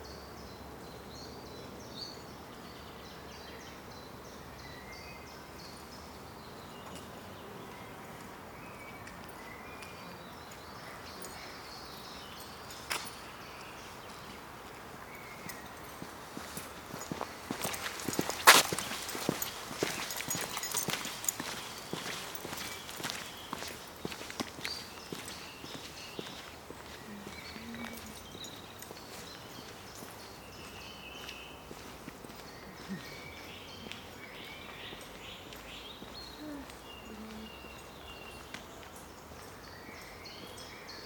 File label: Walk Woman Park